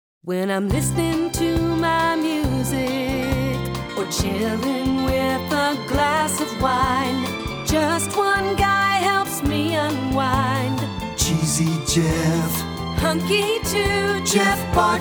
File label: Jinglebells